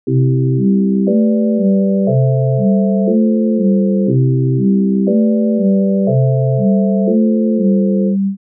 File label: synth